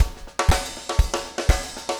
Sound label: 4 on floor 120, loops, 120bpm